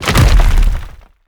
colossal Landed

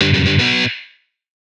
double Up Diamond; netent

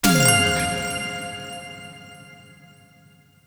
small Win

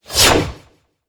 win sweep Vertical; netent

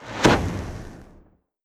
betline End Explosion; netent

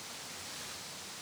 reelspin; netent

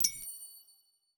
intro Button Click; netent

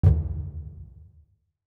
spin btn; netent

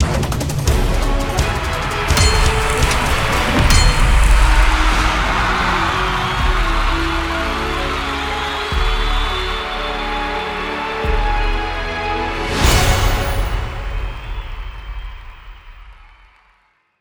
fs Player Win; netent

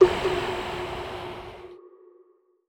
Spin Button

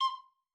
flute; easteregg; netent

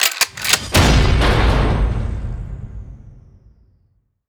mega Win; netent

